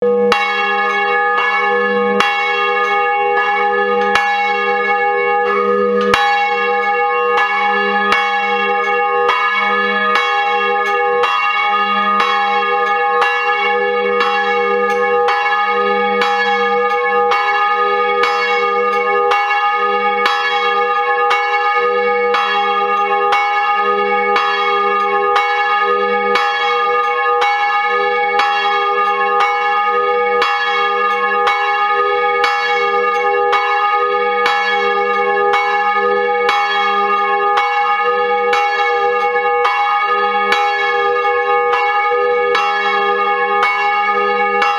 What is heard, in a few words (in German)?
Glocke I